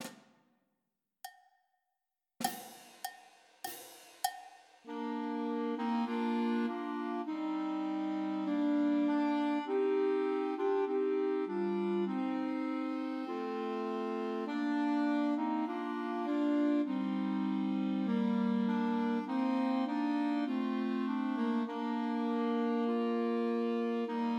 Christmas Trios for Two Clarients and Alto Saxophone; In the Bleak Midwinter